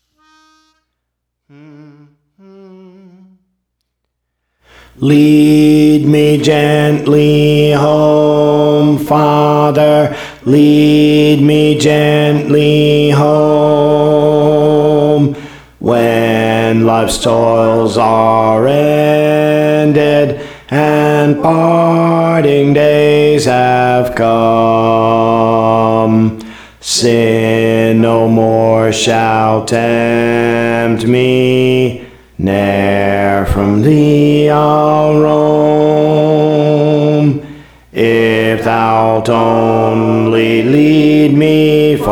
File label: Bass; Praise For The Lord Training; Lead Me Gently Home Father